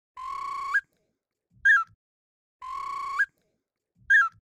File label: Birds; Hip Hop Exotica; Apple Loops; Big Fish Audio